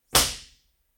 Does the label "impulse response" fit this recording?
Yes